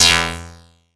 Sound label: sound design resonating buzz